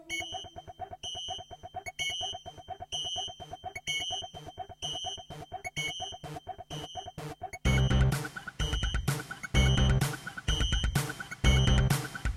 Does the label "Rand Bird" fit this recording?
No